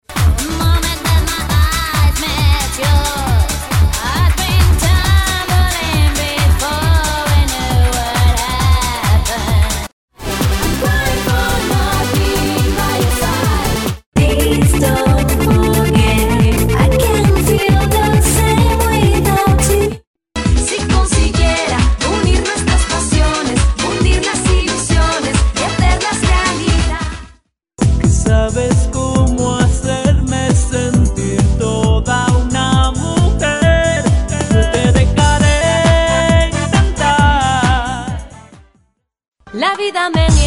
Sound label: songs upbeat